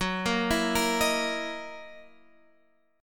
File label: F sharp 13th F sharp down; arpeggiated; JGuitar